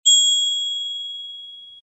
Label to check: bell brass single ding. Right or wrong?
Right